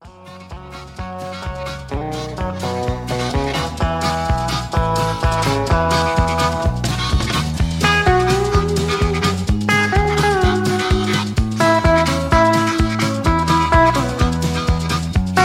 Instrumental

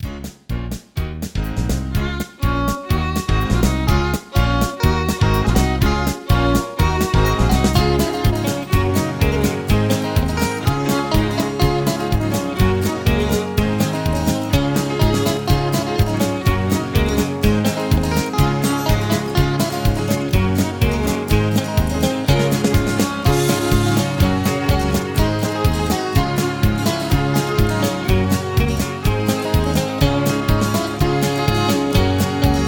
Instrumental Low Key

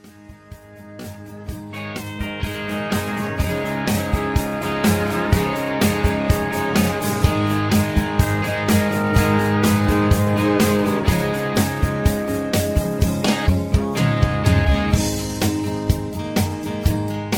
Instrumental With Leads Higher

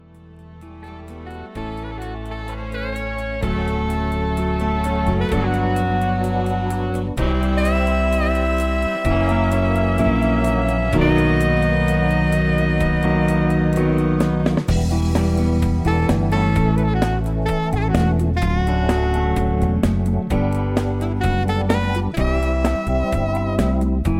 Instrumental Higher

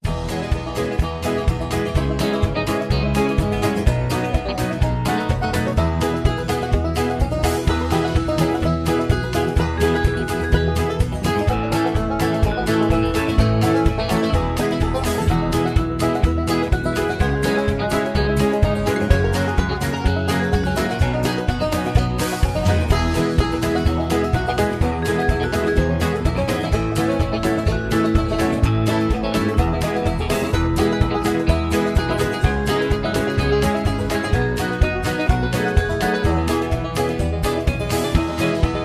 Low Key

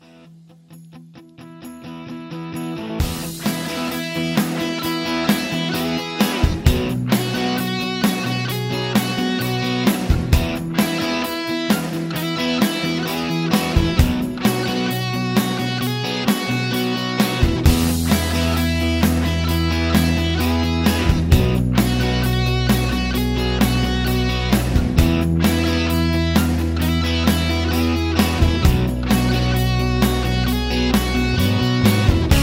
Instrumental

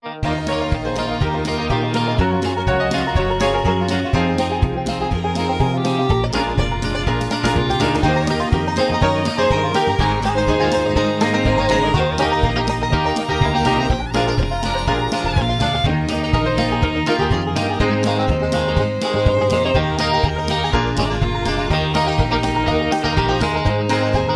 High Key

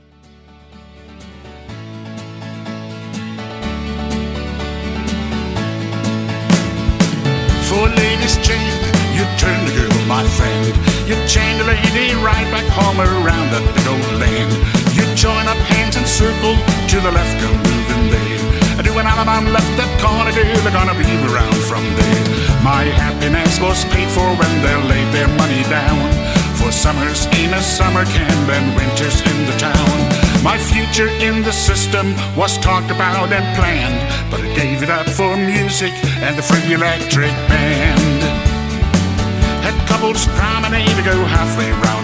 Electric Band Instrumental

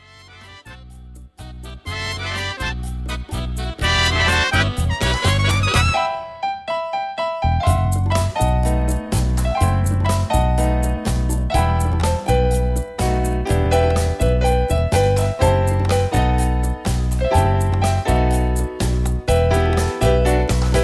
High Key

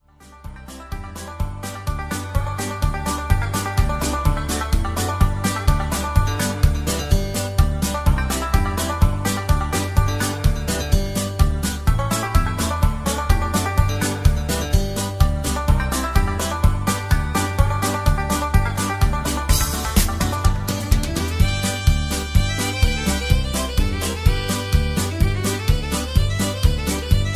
Breakdown Patter

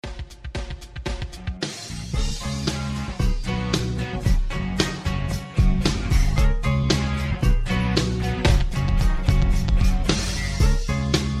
Low Key

